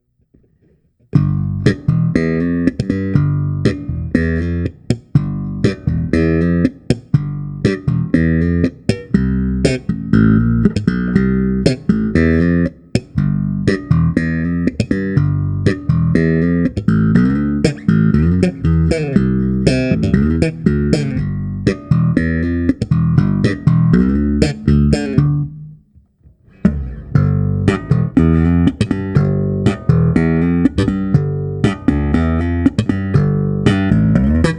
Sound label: SLAP